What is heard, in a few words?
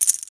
chips, casino; Bicycle Casino; XBOX